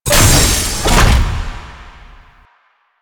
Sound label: Taller Long Door Open; ambience; Warframe; Entrati